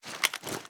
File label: reload empty fast mag in, reloads; gmod